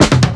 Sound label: tom third; Drums; One Shots; Slate; AURORA Sample Pack; Toms